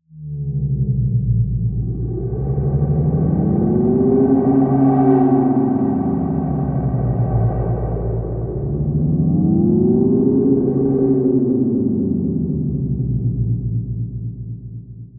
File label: swaying drone deep, drones; musikelemente